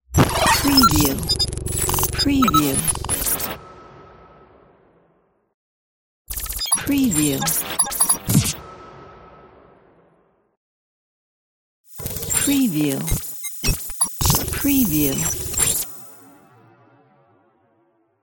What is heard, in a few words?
glitch intro logo easy transition